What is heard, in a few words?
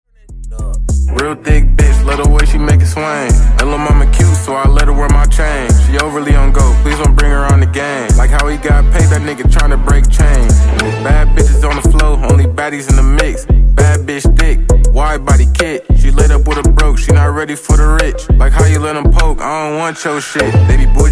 100 Bpm